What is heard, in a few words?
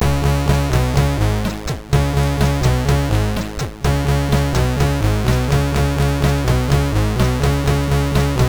Music Imitation sid MOS6581R3